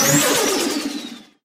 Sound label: Turbo Blow Off